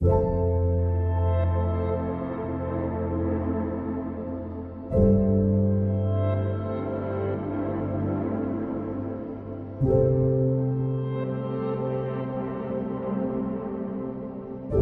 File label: Documentary